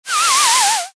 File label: glass scratch